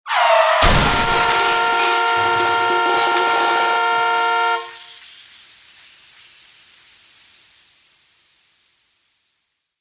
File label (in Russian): tormozhenie gudok udar